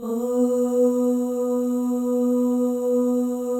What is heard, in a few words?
FEM OHS, Symphony of Voices; Spectrasonics